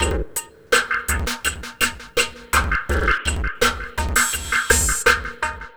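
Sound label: Lo Fi Drum Loop 083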